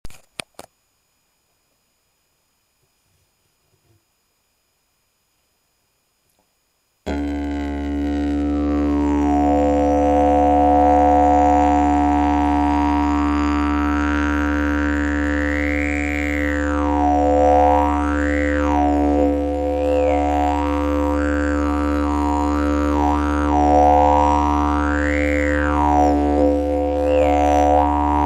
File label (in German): Ahorn D